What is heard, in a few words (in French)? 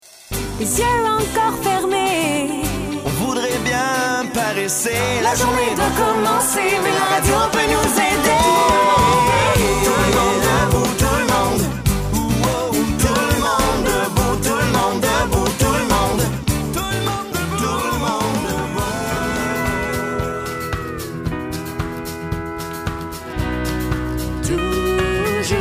Demopub chantee